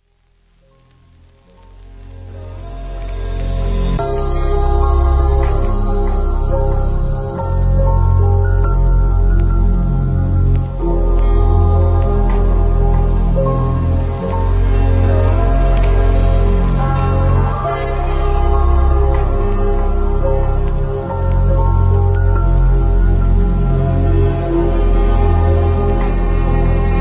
chillout

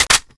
raise first close bolt; gmod

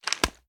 reload end

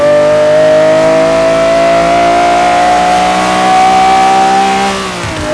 third, vehicles

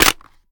reload empty waffle magin; reloads; gmod; iw8

